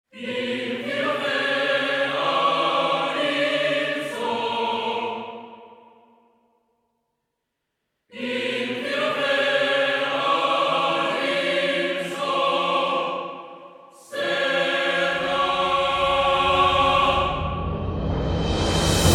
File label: Event BGM; Xenoblade Chronicles 3